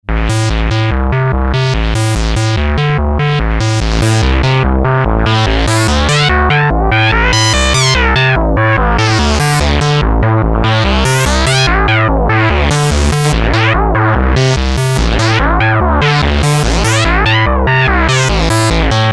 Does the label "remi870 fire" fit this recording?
No